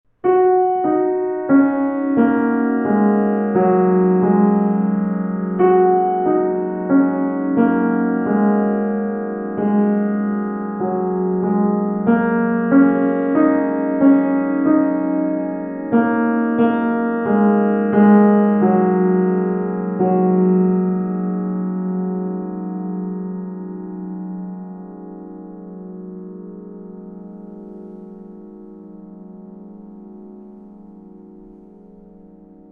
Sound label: Glockenspiel